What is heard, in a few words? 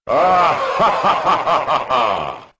laugh; gill